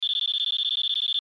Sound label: Alarm Beeps